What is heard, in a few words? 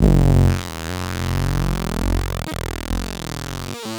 Bass drone F, Circuitbend; Drones - Bass; 99Sounds; Mangling Audio